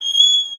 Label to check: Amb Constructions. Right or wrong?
Right